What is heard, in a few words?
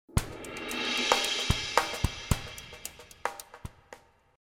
Midwest w Perc; TWC Branding Music; TWC 2003